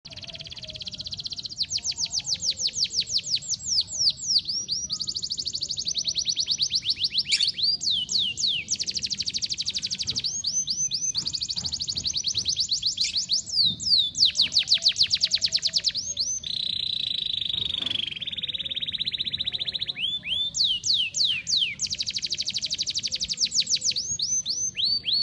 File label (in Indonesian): audio kenari; wolverine; om indra